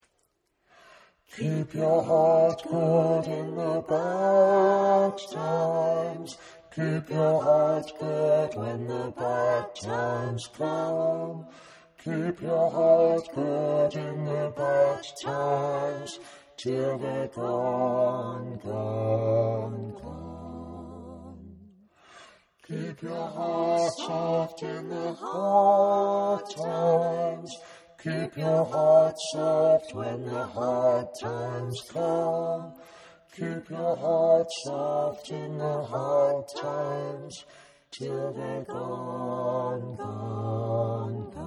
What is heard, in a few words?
Keep Your Heart Good Bass, choir